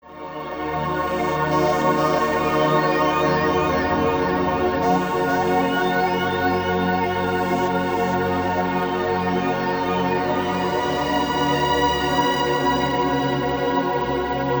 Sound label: Stylophone 350 S Delay; Polychrome